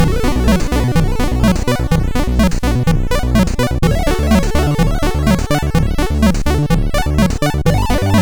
25Hz II, MOS6581R2

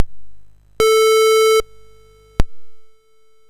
Dragonworld sid MOS6581R4

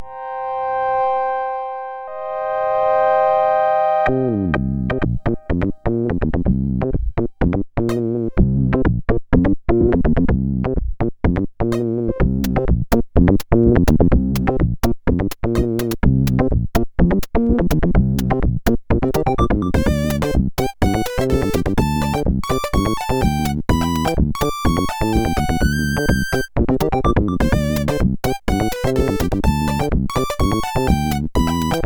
3SID Tracker, CSG8580R5

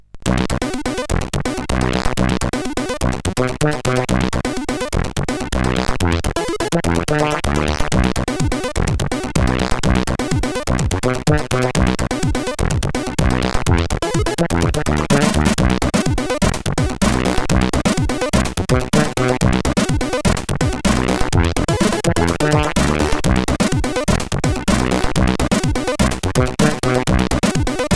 Deadfunk sid CSG8580R5; Early Tunes

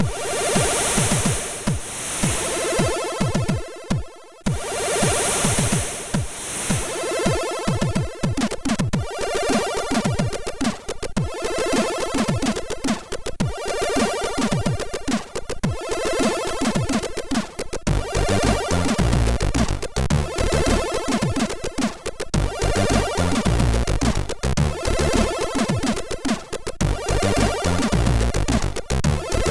Shuffle, sid MOS6581R3

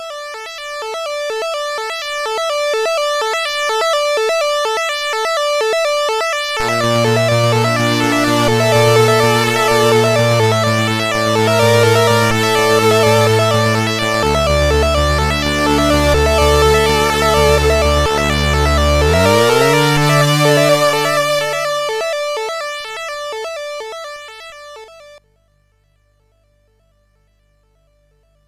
Intro sid CSG8580R5

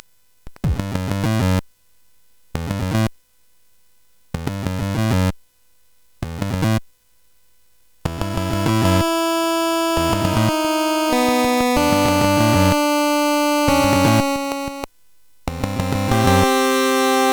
Space Gun

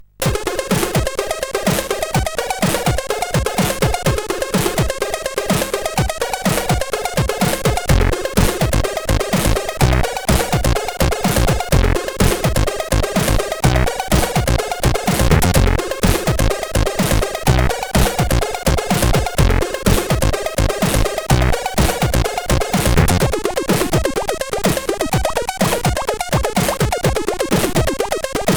House, sid CSG8580R5

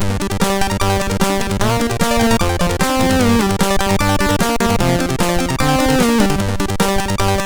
Rotator tune, sid, MOS6581R2

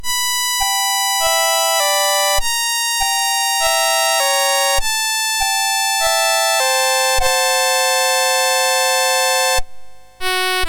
French Ditty, MOS6581

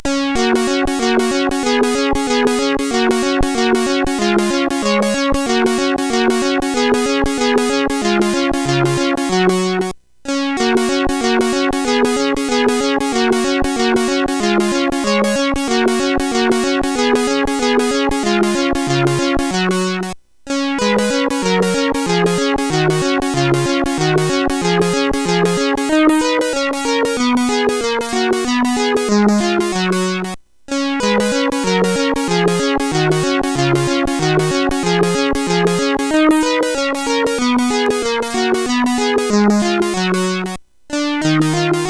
Instrumental Compositions, CSG8580R5

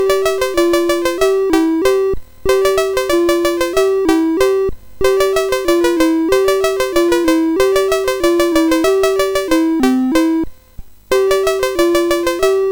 sid MOS6581R4; Caesar the Cat

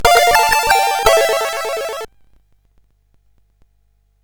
Jingles, CSG8580R5 sid

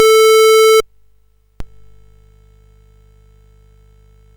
Dragonworld sid CSG8580R5

